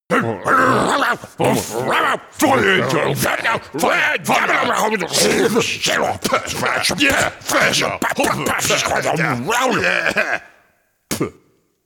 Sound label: excited trolls